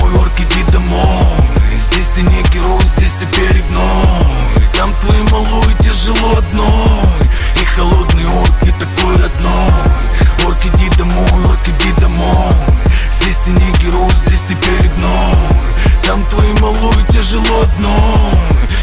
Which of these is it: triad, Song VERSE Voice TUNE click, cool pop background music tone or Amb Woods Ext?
cool pop background music tone